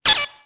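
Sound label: security camera; Soldier Of Fortune II Double Helix; XBOX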